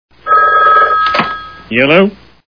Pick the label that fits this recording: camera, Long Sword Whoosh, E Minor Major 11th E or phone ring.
phone ring